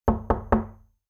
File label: knocking